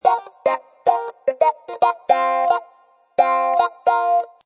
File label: Wah Electric Guitar Riff